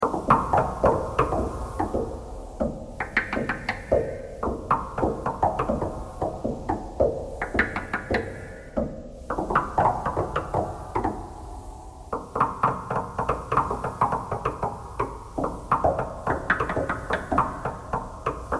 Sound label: knocking thread noise all